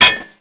metal; Mafie 1

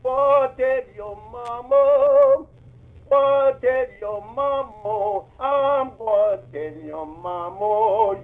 sing; Mafie 1

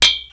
metal; Mafie 1

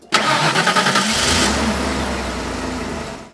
cad on; Mafie 1

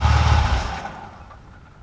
cad off; Mafie 1

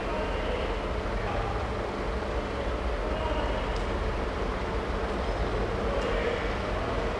industry; Mafie 1

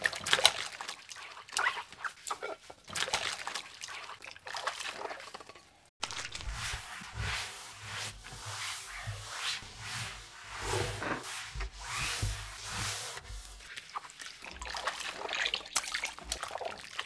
wash; Mafie 1

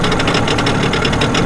boat; Mafie 1

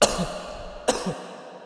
cough; Mafie 1

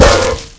bush; Mafie 1

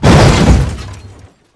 crash; Mafie 1

